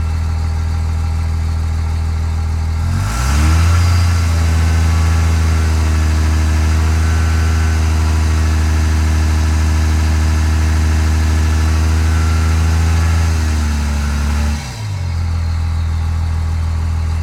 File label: Truck Ram Diesel Fast Idle; Trucks